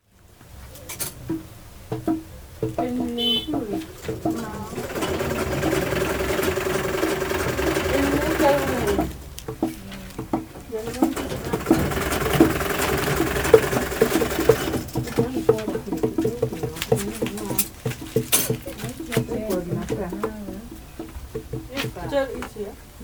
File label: Market Tailors Drum Sewing Machine